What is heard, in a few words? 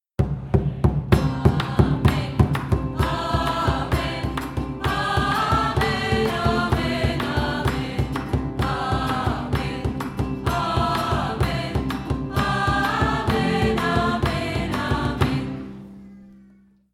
amen